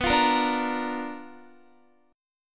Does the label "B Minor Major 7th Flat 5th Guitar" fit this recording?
Yes